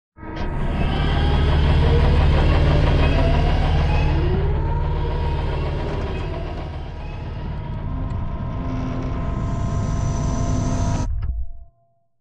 Sideways Town Giant Floor Panel Arrive Rotate Lock Servo; Boomtown; Titanfall 2